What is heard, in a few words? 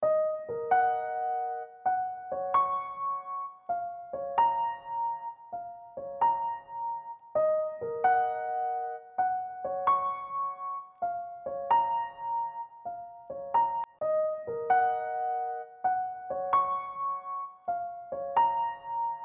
sad french piano